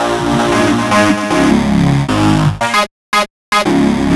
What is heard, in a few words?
Hott Fuzz, Synths; Sonic Foundry (Sony Creative Software); Modular Electronica